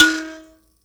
MBIRA E 4; BS MBIRA1; Heart of Africa

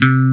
ROCK BASS C4; E-MU Formula 4000 Series Vol. 5; Protozoa; Proteus1 Instrmt

